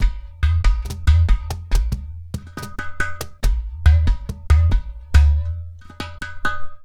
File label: Hand Drums; Sonic Foundry; Cinematic Funk Urban Tone Poems; Master Zorn